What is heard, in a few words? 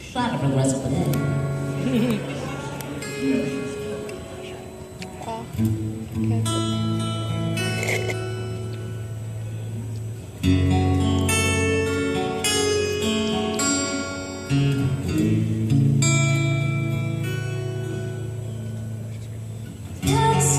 Acoustic Live